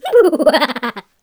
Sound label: Mouth Fx, Vocal